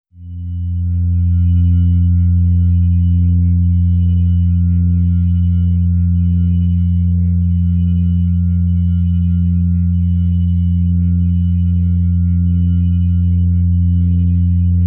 organ tone f

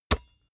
basketball single hand catch

